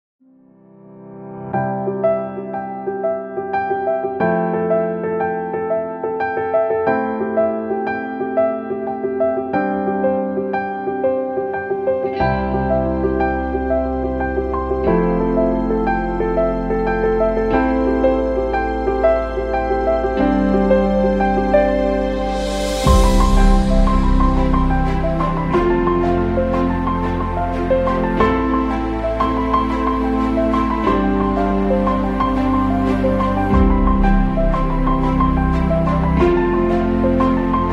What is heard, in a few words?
Over the Ocean